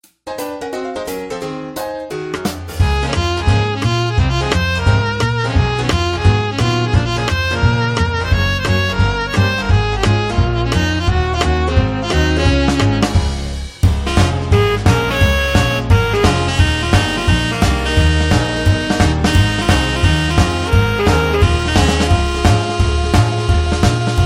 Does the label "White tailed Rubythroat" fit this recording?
No